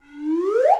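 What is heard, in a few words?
slide whistle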